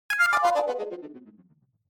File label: Magic down